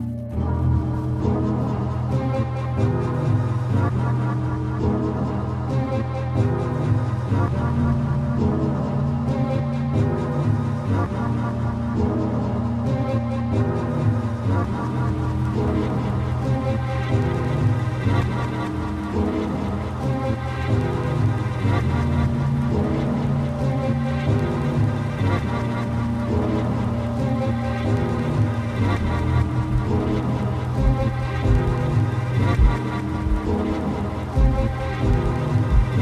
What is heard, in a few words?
Electronico